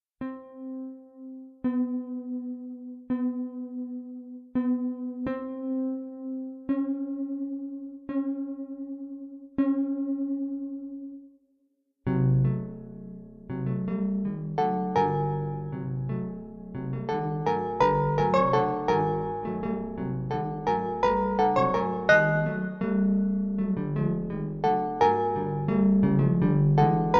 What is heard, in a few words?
Arpa